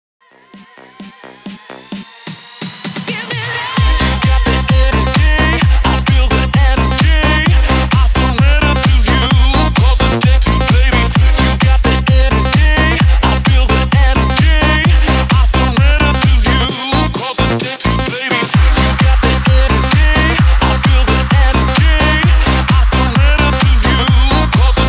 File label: 130 BPM